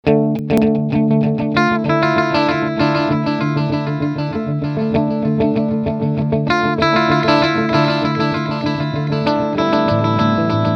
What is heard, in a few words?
Dual Delay